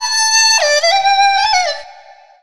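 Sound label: CH FLUTE, Chinese flute; Soundscan vol.60; Asian Traditions; AKAI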